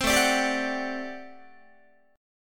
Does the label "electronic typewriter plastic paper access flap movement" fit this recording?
No